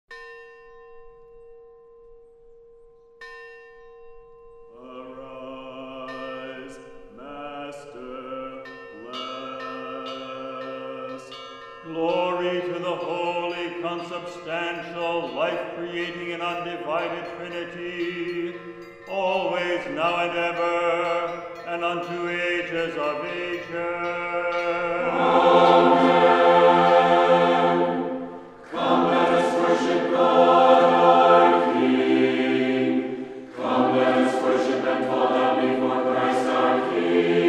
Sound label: Chorale, Bells Come